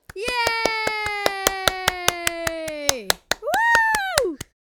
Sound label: Cheering boy child kid